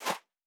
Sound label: Footstep Sand Walking